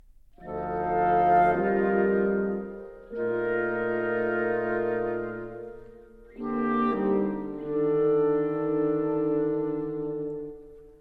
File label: Partita For Woodwind Quintet, Interlude